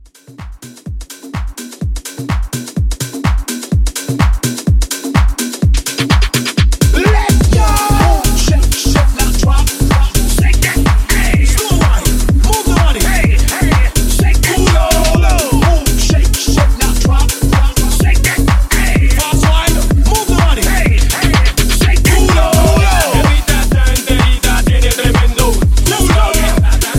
House Mashup Edit 126

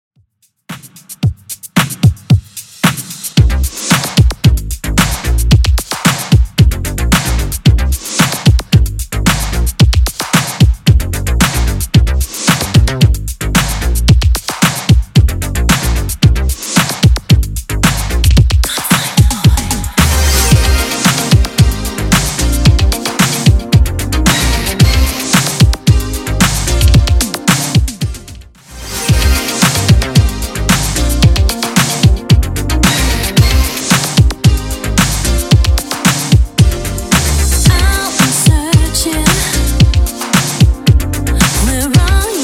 80s Retro Redrum 112bpm

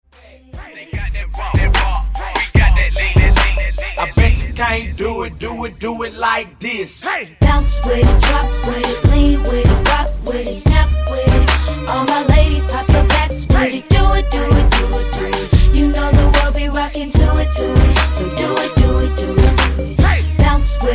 Funk Transition 74 125 Bpm

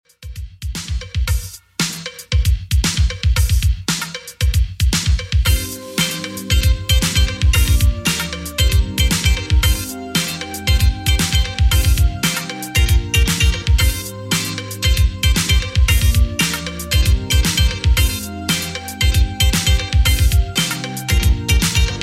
80s Redrum 115bpm

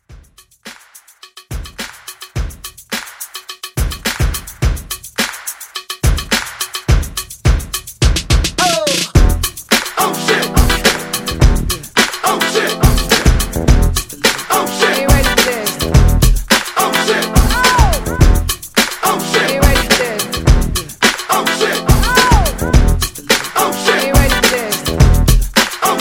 Mashup Re Drum

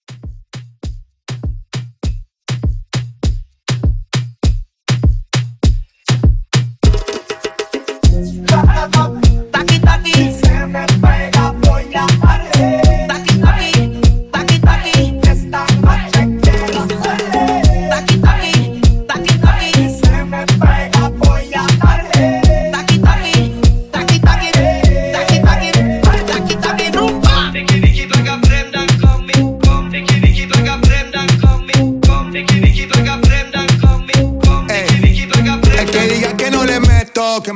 Moomba Club Hype

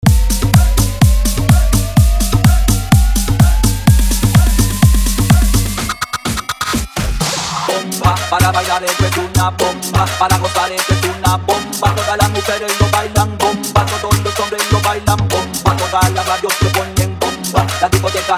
Azul azul Guaracha

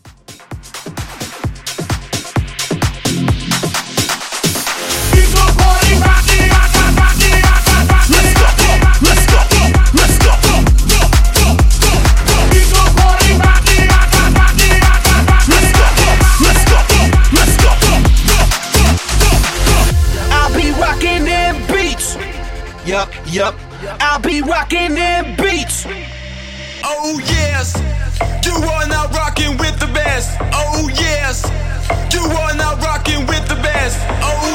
Festival Hype Edit 130

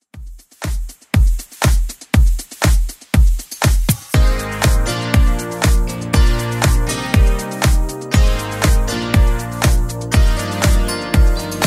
80s Club Edit 120bpm

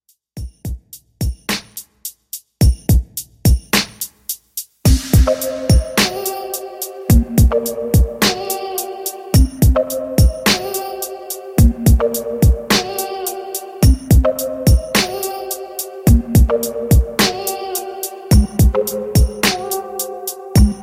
Club 107Bpm

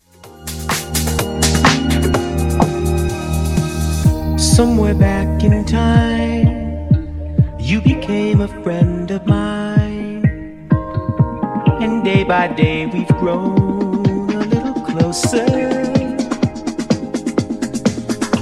Funk Bootleg 126Bpm

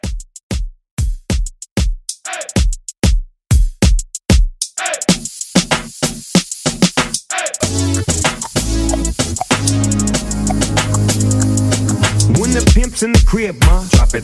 Dancehall Club

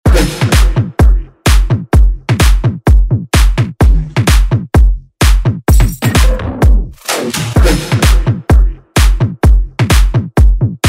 Bounce Edit

